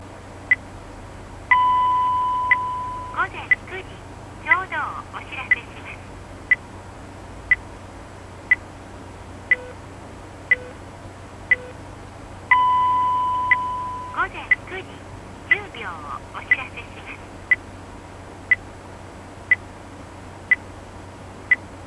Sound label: ISDN; Softbank Telecom Otokuline ISDN; LEAPSEC